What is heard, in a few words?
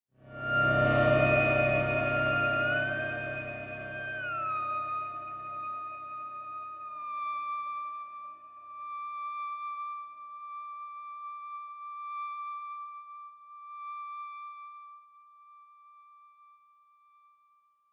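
sound music death; Hyper Light Drifter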